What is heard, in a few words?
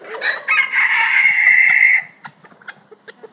chicken high pitched squeak; sound effects